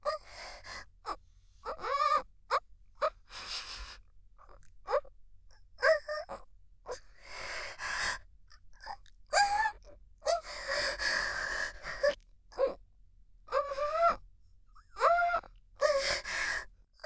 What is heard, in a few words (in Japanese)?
喘ぎ 連結版; ありすちゃん（リスみみの地下アイドル＋コンビニバイトAさん）